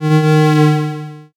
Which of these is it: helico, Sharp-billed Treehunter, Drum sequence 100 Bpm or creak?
creak